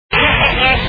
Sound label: hehe